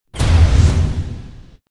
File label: Ship Weapon Project Strike